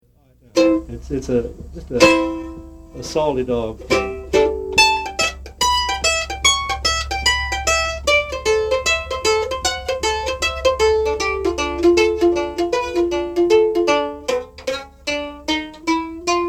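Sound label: Chords, mandolin; Salty Dog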